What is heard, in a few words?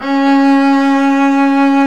STR, Cb Bowed, f vb; Roland L-CD702; Cb2 f vb